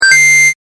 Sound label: coin